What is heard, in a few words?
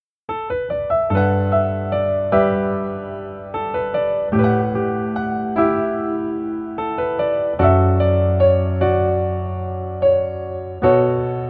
Piano